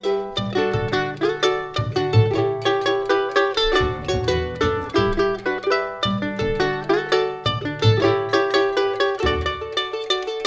Land of Calypso, musicbox

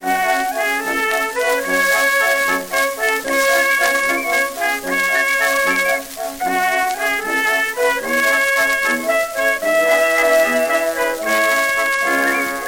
Happy birds waltz, classical

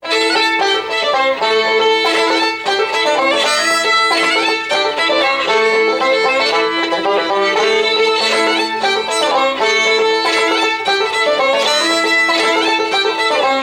Henry Reeds Breakdown, musicbox

